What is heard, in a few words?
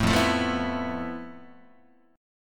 F Major Sharp 11th, Guitar; strummed; JGuitar